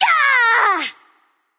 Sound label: battle cry; smite; persephone